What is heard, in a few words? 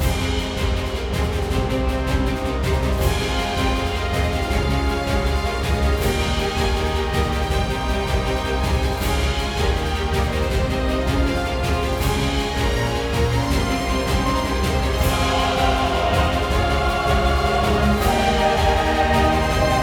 Heroic boss; Grimlight: A Tale of Dreams